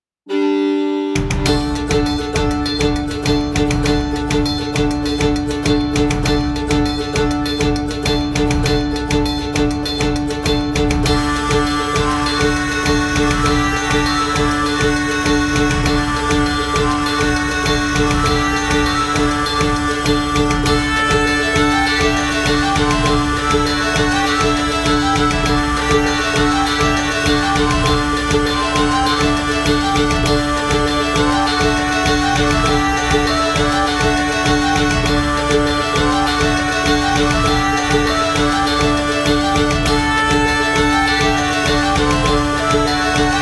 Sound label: BGM Celt 100